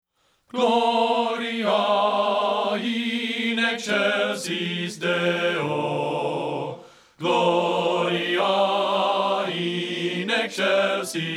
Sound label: GLORIA IN EXCELSIS DEO BASS 2; men; partbypart